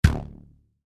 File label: Rubber Band Snap; Miscellaneous Office; Foley; Offices; Blastwave